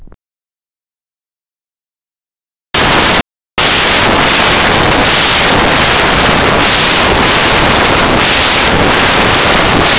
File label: squawk, heart sounds; Inspiratory; Squawk and Crackles; Recorded over the right posterior upper chest of a 78 year old woman with interstitial pulmonary fibrosis.